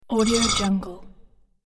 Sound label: robot core user sound effect; sonic-boom